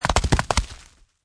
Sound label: Bullet Machine Gun Impact Earth, Machine gun projectiles impact with earth/ground; Impact Sound Effects; Bullet hit